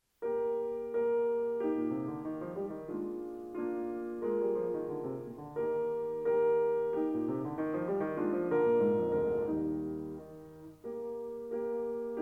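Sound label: Piano Sonata No. 13 in E flat major; Wilhelm Kempff; Beethoven; Piano Sonatas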